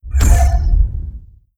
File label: dimensional horror tentacle attack; Stellaris; guardians